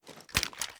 reload empty fast xmag end; gmod

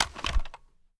reload mag end; gmod

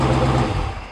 stop, vehicles

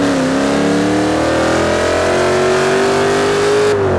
second, vehicles

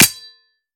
melee world knife cement; viper

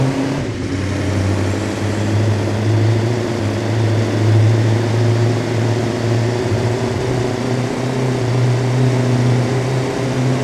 fourth cruise, vehicles; bus

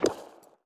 menu tabs move, user interface; gmod; mw; iw8

